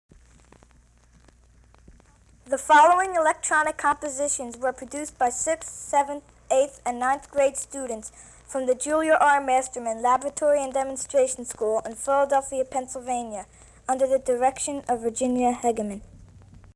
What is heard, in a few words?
Moog; BL Moog School; Julia R Masterman School Pieces